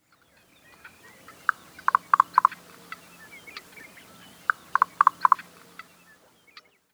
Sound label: Chihuahuan Raven Knocks; Rabenvogel